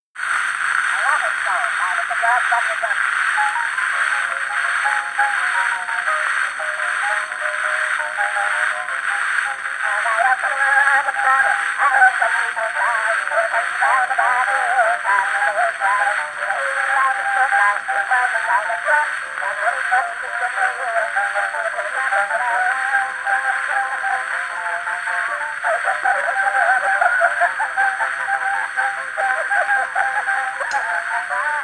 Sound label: grammophone